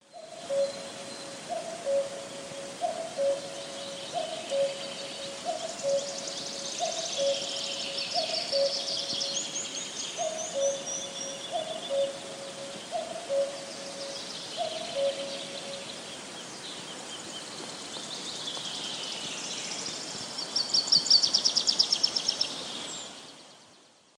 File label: cuculus; käki; laulu3; linnut